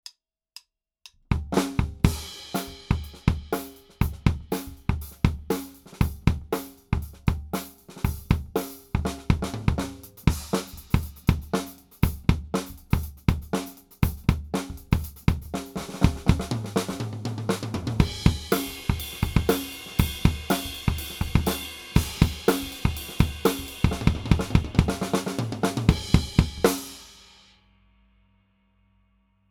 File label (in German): Yamaha EAD10 Mikrofon pur